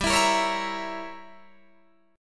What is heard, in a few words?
G Diminished 7th, Guitar, Open D